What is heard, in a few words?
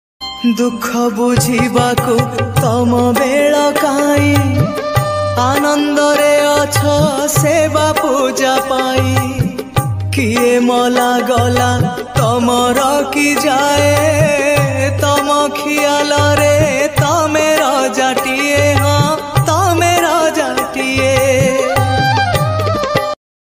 odia bhajan jagannath bhajan ringtones